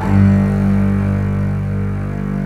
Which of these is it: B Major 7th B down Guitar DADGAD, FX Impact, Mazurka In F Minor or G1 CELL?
G1 CELL